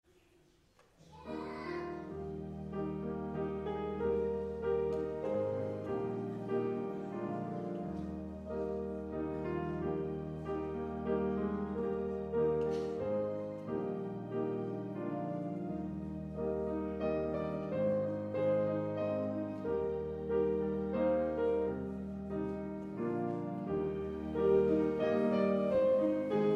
Vorspiel

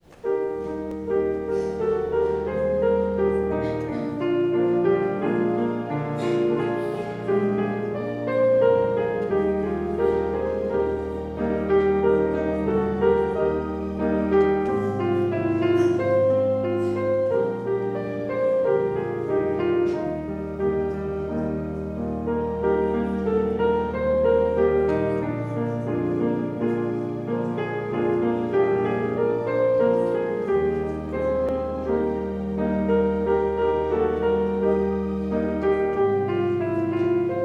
Vorspiel

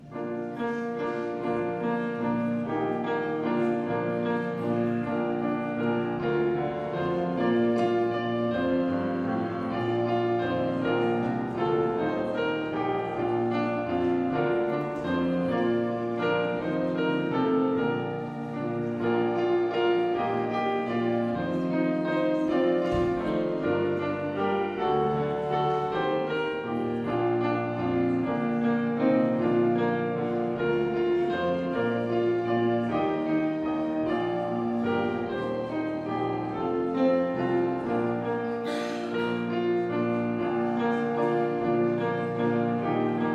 Vorspiel

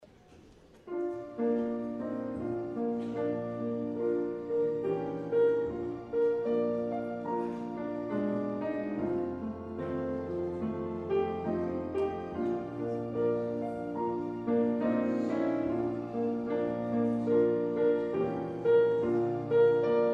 Vorspiel

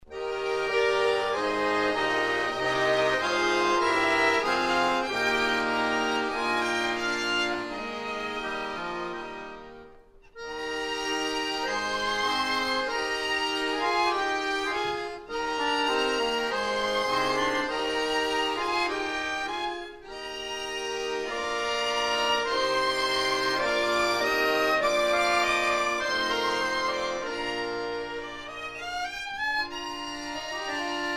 Akkordeonensemble

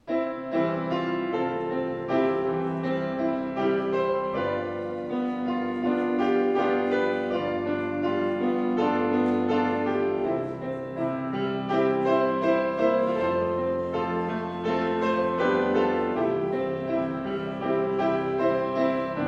Vorspiel

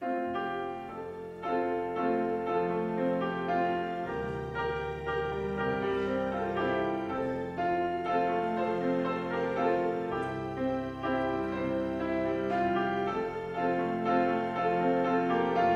Vorspiel

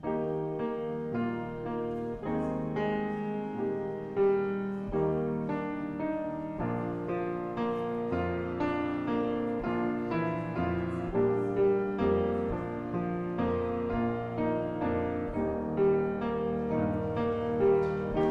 Vorspiel

